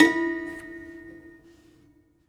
Prepared Piano; Battery 3 Sample Kits

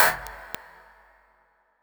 Kaut, One Shots, Tik; Sonic Foundry (Sony Creative Software) - Future Retro Dance Excursions

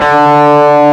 D2, GUITAR; AKAI S-Series CD-ROM Sound Library VOL-8

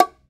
E BONGO R, World Percussion

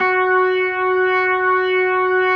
F#4S, B3, Vintage Keyboards

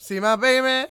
croon, Vocal Planet Blues Soul; Spectrasonics

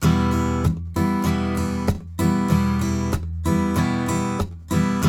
riff 96, Blues Guitar; ILIO; Fretworks

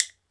WOOD BONE, Ireland

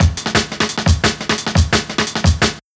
DNB BREAK

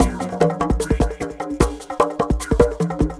DJEMBE FRAME MACHINE COMBO; Sonic Foundry (Sony Creative Software); Vir Unis Infusion

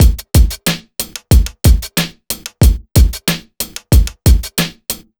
BEAT, Underground Hip Hop; USB Soundscan vol.02; AKAI

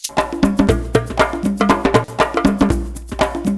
SHAKER, Modern Percussion Loops; Soundscan vol.56; AKAI; SHAKER133

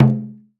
Drum; EMU Planet-Earth; Harmon

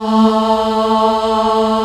cho A2, Choir Female AH, AKAI S-1000; Retro Sampler

